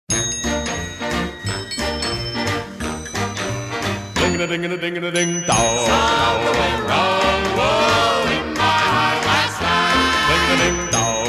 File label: chimes